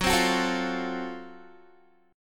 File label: Gb Minor Major 7th Flat 5th, Guitar, chord; strummed